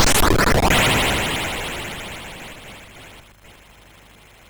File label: sid MOS6581R2; hvsc